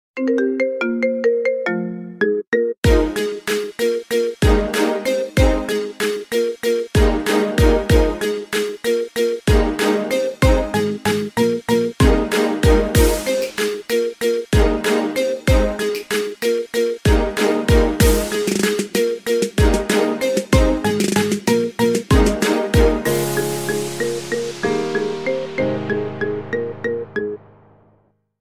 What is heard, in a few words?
iphone marimba remix